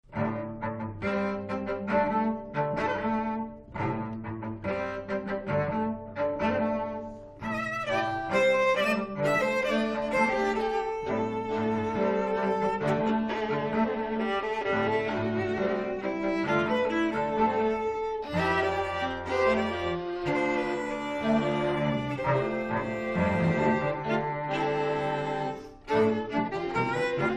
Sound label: string trio